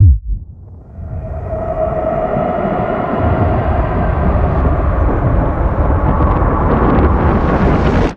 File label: Nuke Scene; Warframe; NineteenNinetyNine